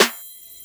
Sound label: snare oneshot, Pop Sample Pack; Drums; SD PASADENA Pop Sample Pack; Slate